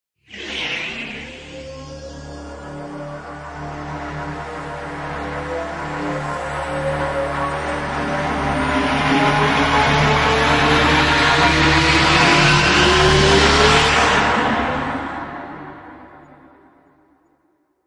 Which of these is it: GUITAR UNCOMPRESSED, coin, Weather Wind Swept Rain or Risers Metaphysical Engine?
Risers Metaphysical Engine